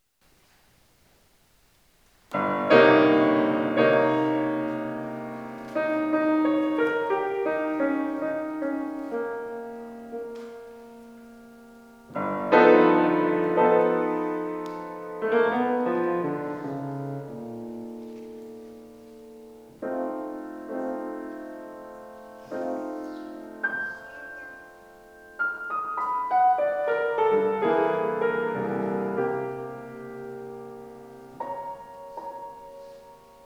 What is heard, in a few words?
piano